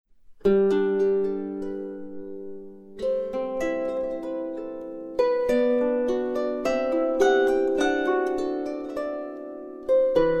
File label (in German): Norsk Brudmarsch, Harfen